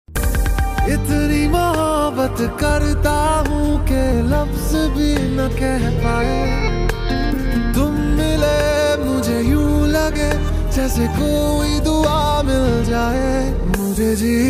Hindi Love Song Ringtone

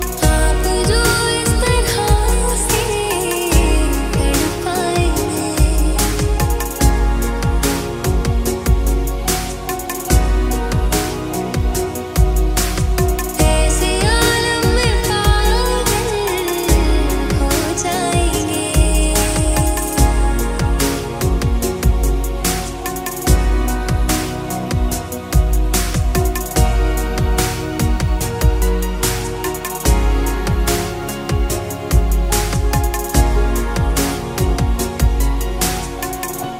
Female Ringtone

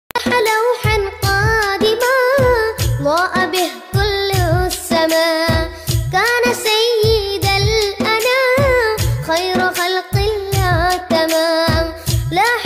Arabic Naat Ringtone

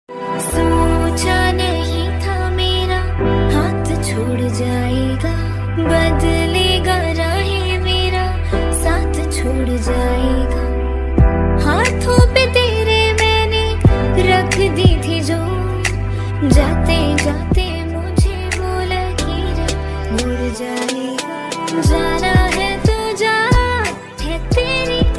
Sad Female Ringtone

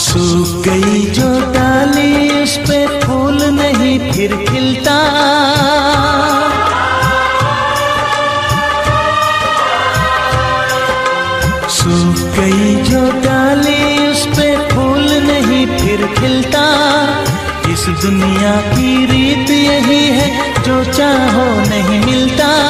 Sad Song Ringtone

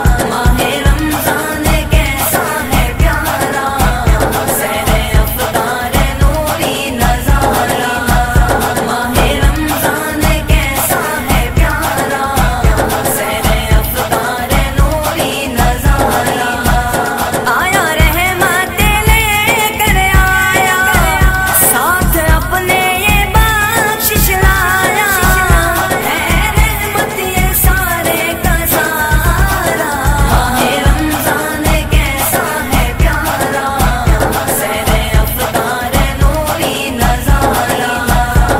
Ramazan Naat Ringtone

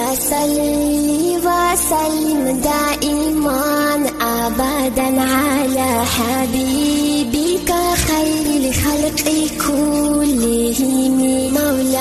Arabic Islamic Ringtone; ringtones

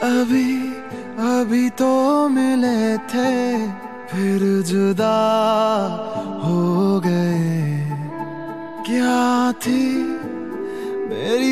Hindi Ringtone

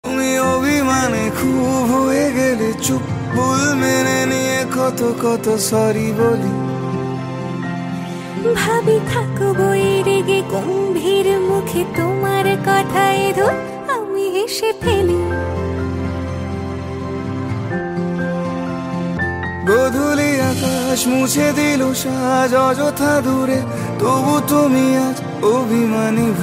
Bengali Ringtone

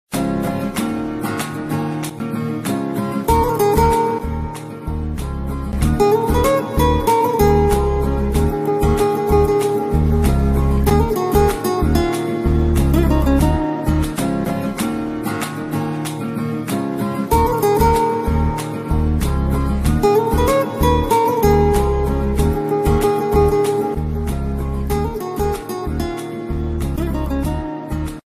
Love Ringtone

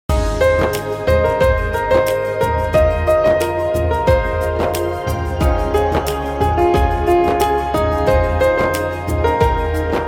Song Ringtone Instrumental; Baaghi 2

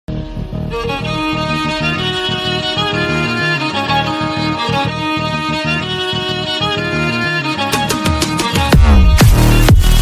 Remix Ringtone; Captain Jack Sparrow

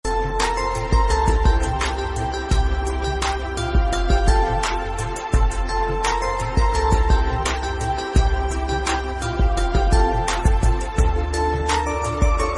Instrumental Song Ringtone

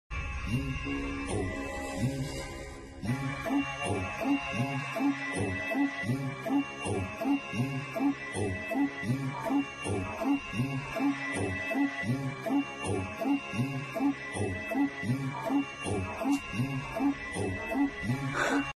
Horror Ringtone; Ratris Khel Chale

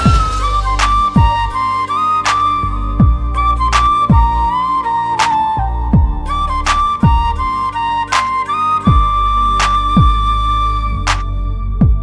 Love Bgm Ringtone

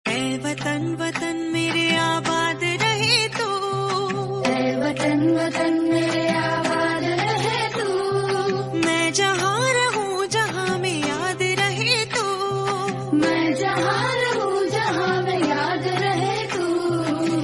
Independence Day Song Ringtone

